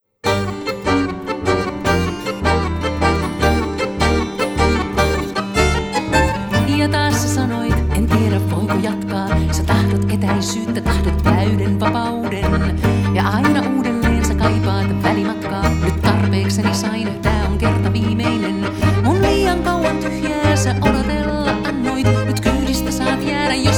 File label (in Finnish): tango orkesteri